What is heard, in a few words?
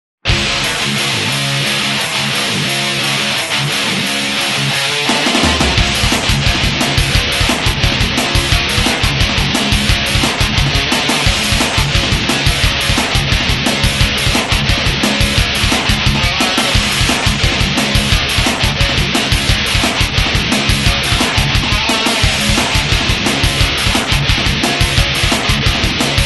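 rhythm guitar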